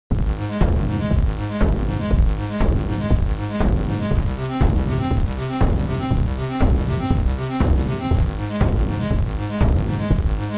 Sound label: music; spacegame